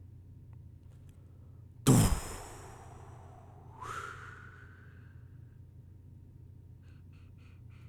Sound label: Interesting Boom